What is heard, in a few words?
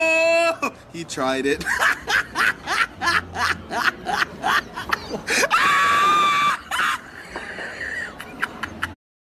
laughter; he tried it; meme